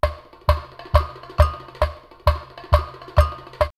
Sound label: Rio de Janeiro 134, drumloops World & Ethnic; X-Static Goldmine